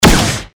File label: future gun shot